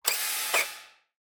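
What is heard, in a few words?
adrenaline inject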